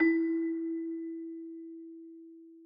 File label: Back Celesta Low E